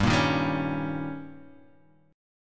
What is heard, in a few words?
D sharp 7th G, guitar